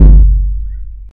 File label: Drum; Princess & Conquest v0.20.06